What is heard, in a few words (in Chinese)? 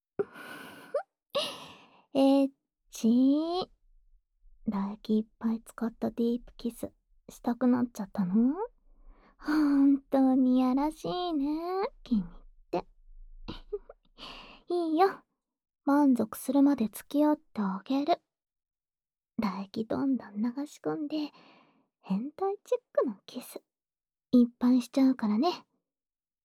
唾液滿滿深吻; 自慰支援用; 青春べろちゅー委員会♪～絶頂キステクで、口内恋愛のいろはをレクチャーします!